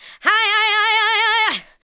ADLIBS

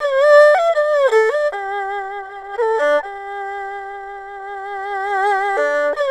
ERHU 90 C; INIS - Opium

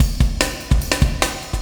Warp Drive Beat, Processed Drumkits

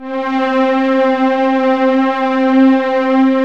CHORUS STRI; E-MU Formula 4000 Series Vol. 2

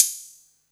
HH, 160 BPM, Drum & Bass; Ueberschall; Drum & Bass Flash Trackz

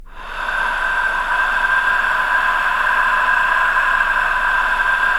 NOISEBED, VOCAL WIND; ILIO; Vocal Planet; Jazz & FX

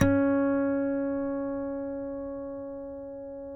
HARM C#4, Guitars; Spectrasonics; Hans Zimmer Guitars VOL-2; FNGSTYLE PFM

